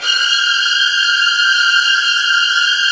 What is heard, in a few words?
VN TRILLS, Virtuoso Strings